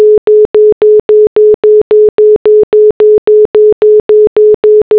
congestion; Asus P835

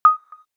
Child menu; Samsung SGH-A257

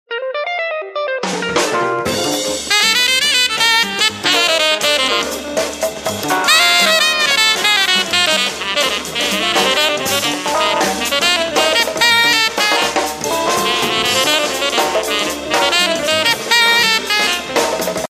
Jazz Bar; Samsung GT-M7600 BeatDJ

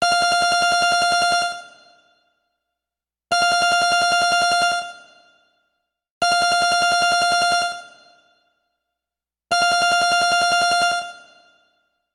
Old Ring, LG Mobile Sound

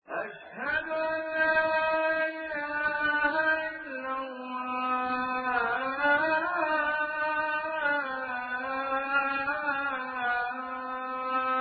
azan; Spreadtrum

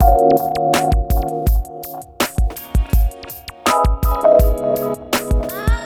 RnB, ringtones; Playa; Google; NewWave Labs

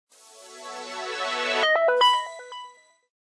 Power On, phonetones

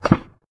ink lock, phonetones, ui; LG Optimus G F180K

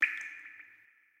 ocean unlock; LG G Flex

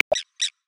Spur-winged Plover; Xiaomi Redmi-Note-8-Pro; Africa

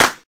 drumset, drums

